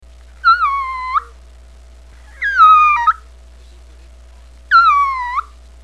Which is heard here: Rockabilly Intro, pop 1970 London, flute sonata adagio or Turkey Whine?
Turkey Whine